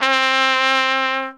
SOLI TRP, Alpine Volksmusik TROMPETEN

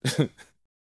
LAUGH, Jazz FX; LAUGHS; Spectrasonics Vocal Planet

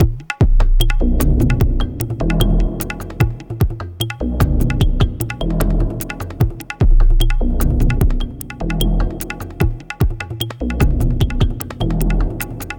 Tablatron, Processed Percussion

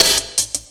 HH LOOP; USB Soundscan vol.27

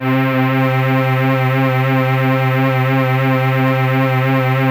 SYN, ENSEMBLE

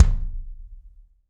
BD AMBIENT, Real Mega Drums; Best Service

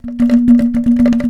A#2 anklung, Java anklung; WorldSounds

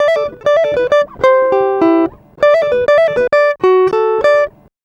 Acoustic Guitar 99 bpm G, African Guitars

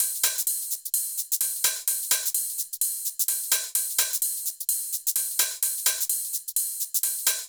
Loops 128BPM, Drumloops

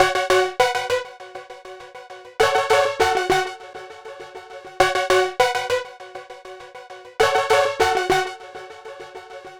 Trance Lead; Sonic Foundry (Sony Creative Software); Club Joints and Street Anthems

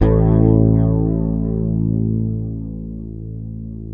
Fingered bass A1 R; Sampleheads; Will Lee Bass Library

